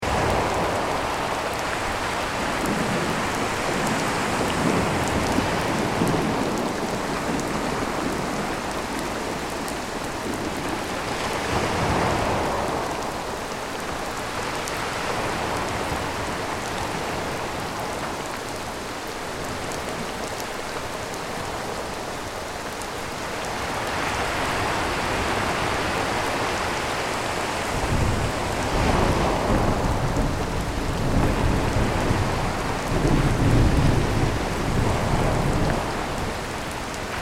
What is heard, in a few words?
Ocean storm ship ambience loop